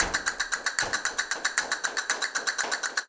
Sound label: Castanets, Flamenco